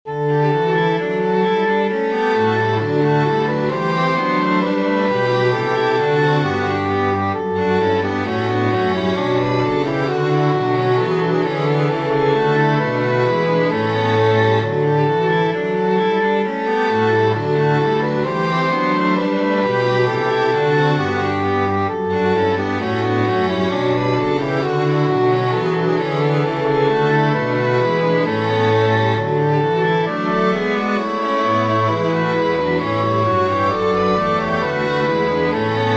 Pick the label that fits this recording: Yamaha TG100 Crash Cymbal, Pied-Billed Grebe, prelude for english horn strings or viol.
prelude for english horn strings